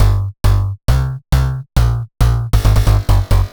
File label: Digga 136 A, future rave samples, 136bpm